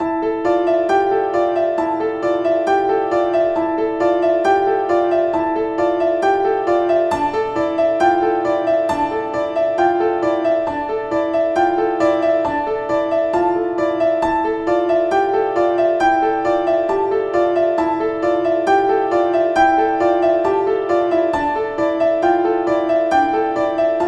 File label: solo piano